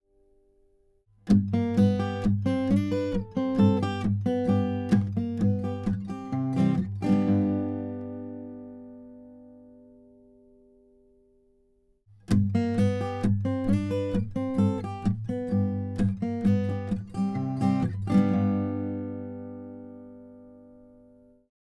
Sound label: AKG c414XLS vs AT4050 Finger Picks